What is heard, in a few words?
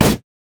red mech melee basic attack; minions